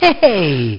LAUGH; BattleSNDS

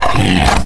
BLOODCAT GROWL

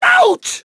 HIT; BattleSNDS

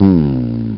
humm; BattleSNDS

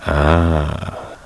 LAUGH; BattleSNDS

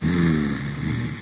humm; BattleSNDS

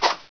SWOOSH

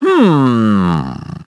HUMM; battlesnds

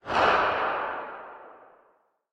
echo underground

